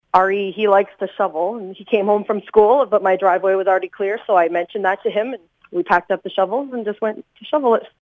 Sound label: shovel